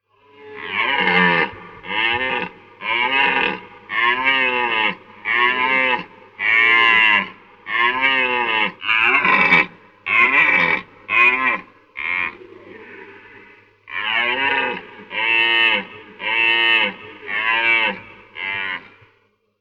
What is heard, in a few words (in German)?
Rothirsch; vogelstimmen